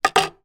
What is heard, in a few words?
household sunglasses plastic down on wood table; zapsplat